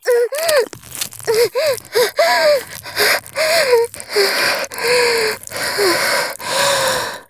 絶頂 潮吹き, ASMR; おまけ(絶頂+潮吹き+中出し ランダム再生)

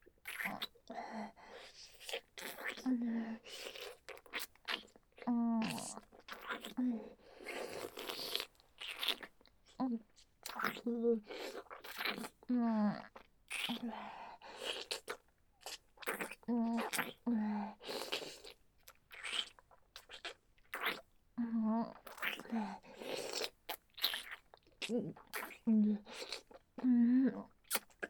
しゃぶりつきフェラ; 生意気ナツキはナツキに勝ちたい! ～夢の中でも勝手にイっちゃう弱くて強気なボーイッシュ×2～

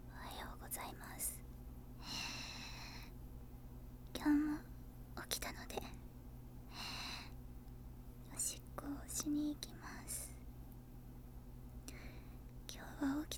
朝起きておしっこへ; 寝起きおしっこ

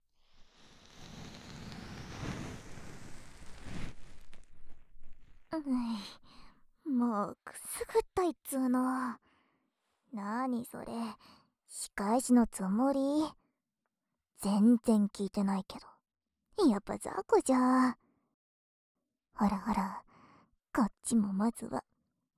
右耳かきパート; 本編左右反転ver

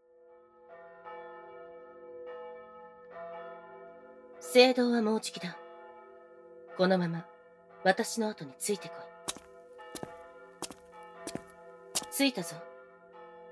手コキ 耳舐め ちん嗅ぎ; 魔法のちんぽを調査; KU100; 気高い低音聖女と事務的クールな女神官

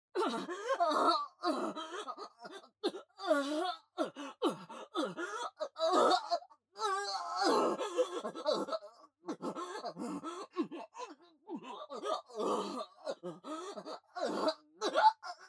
バック 普通 下品なオホ声; ヒルダ

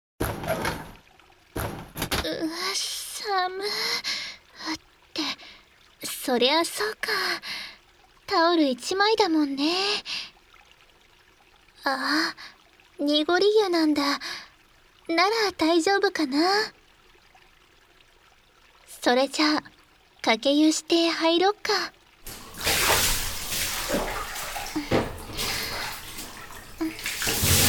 露天風呂で、しゃかしゃかシャンプー

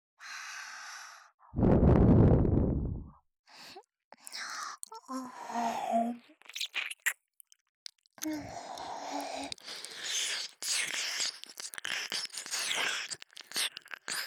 右耳舐め, ASMR; 【逆NTR】妻が隣にいるのに…爆乳兄嫁の胸元チラ見せノーブラ誘惑～バレてはイケないねっとり射精おあずけセックス～; アドリブ集

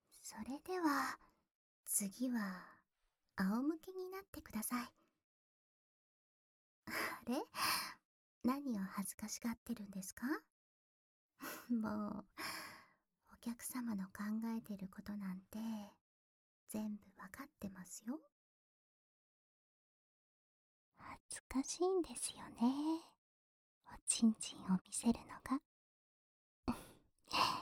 仰向け鼠径部マッサージ＆貪欲フェラチオ施術, ASMR

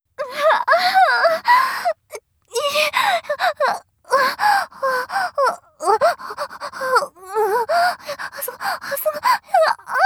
喘ぎ声 感度高め, ASMR; 清楚ビッチエルフ姫のメガ盛りマントロフルコース

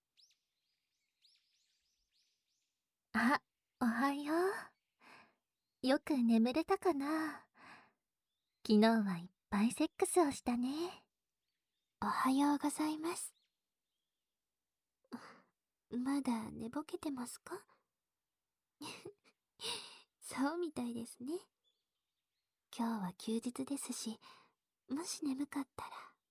神社お泊り耳舐め, ASMR; 八重と美耶の神社お泊り耳舐め♪

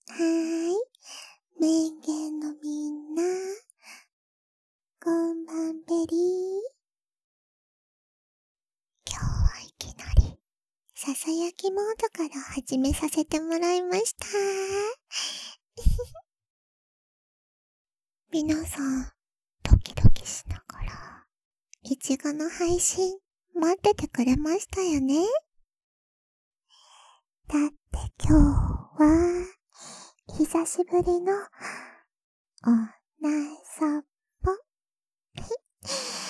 外耳道 を 埋め込む 耳舐め ＆ 鼓膜舐め; 甘媚びオナサポ配信(耳舐め・オナサポ・言葉責め・乳首責め・カウントダウン射精); あざと可愛い配信者の甘媚び耳舐めオホ声えっち ～にゅっぷり外耳道埋め込み舐め&鼓膜密着舐め～

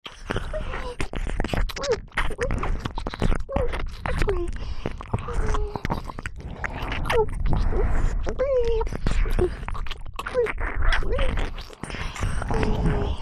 ディープキス ねっとり両耳舐め 耳塞ぎ舐め; 琴音; オナサポ用おまけループ; 【催眠⇒オナホ】催眠アプリで下校中の少女をお持ち帰りペット調教♪; KU100

